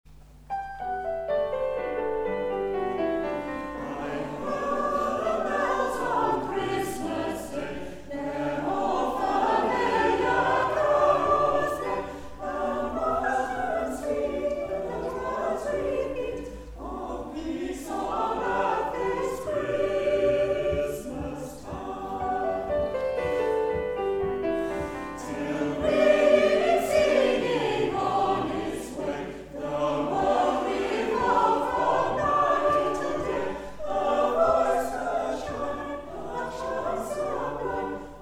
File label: Bell Carol